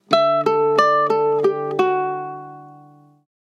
sms guitar; Mudita Pure

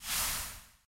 clear ram, ui; LG Optimus G Pro F240K Lollipop

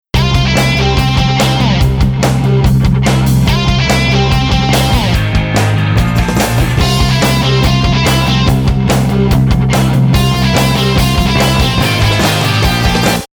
Guitar fun; Sony Ericsson; C902; ringtones

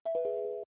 Call End

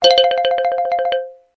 Xylophone Bell; LG T500 Ego

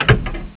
mechanic click; Nokia; 5800 XpressMusic; Bounce Touch

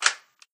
Unlock, phone ui

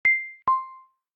Tick Tock, Alerts; Samsung Gear S3 Frontier

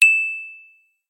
Ting; LG; SU640 Optimus LTE

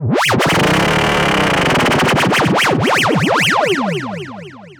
fx; ringtones; Google; NewWave Labs

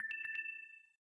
Low Battery in call

